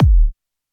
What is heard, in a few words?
Deep House Kick Drum